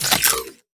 Button Bottom Bar Click; Custom UI Sounds; Call of Duty Advanced Warfare

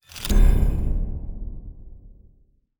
Button Click; Custom UI Sounds; Call of Duty Black Ops 3

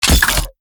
Button Bottom Bar Click, UI sounds; Custom UI Sounds; Call of Duty Black Ops Cold War

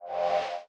Menu Modding Close; Custom UI Sounds; Call of Duty Black Ops 1

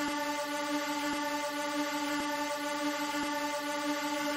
drone sound loop, FPV Drone; SPT FPV Drone Mod

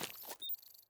Menu Weapon Disassemble; Custom UI Sounds; The Division 1

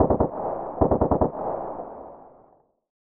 scarh burst double, weapons; scar heavy; SPT Battle Ambience

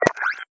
Button Bottom Bar Click; Custom UI Sounds; Call of Duty Ghosts

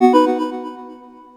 Achievement Completed; Custom UI Sounds; Stardew Valley

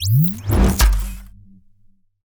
Quest Sub Track Complete; Custom UI Sounds; The Division 1

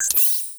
Backpack Close; Custom UI Sounds; Call of Duty Black Ops 2

